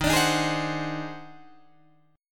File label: D sharp Minor Major 13th, Guitar, DADGAD; strummed; 13,17,13,13,15,16; chord